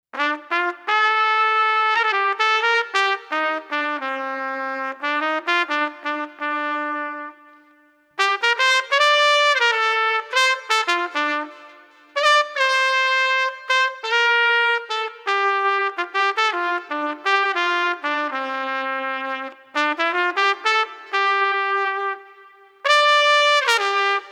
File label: Street Trumpet Forlorn 78